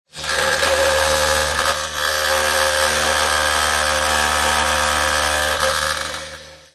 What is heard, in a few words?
industrial power tool vibrate grind metal motor hum